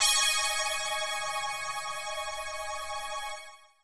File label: 125 pad E, Keys, Nu Jazz House; PowerFX - Nu Jazz House